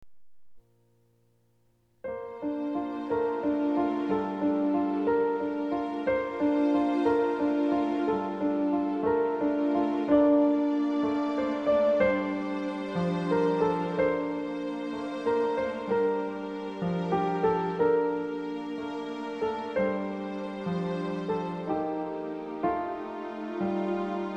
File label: piano; ladyandtramp